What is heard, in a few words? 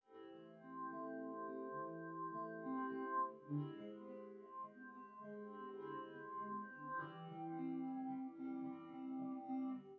nodrums